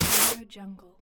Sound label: broom sweep